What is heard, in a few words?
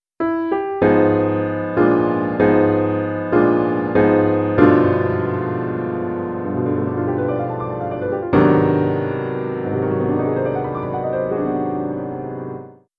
lounge pianist